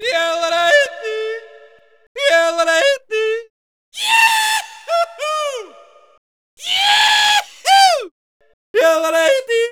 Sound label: yodeller, Cartoon Film Effects